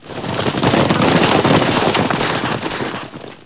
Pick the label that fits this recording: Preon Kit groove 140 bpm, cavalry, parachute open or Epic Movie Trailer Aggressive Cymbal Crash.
cavalry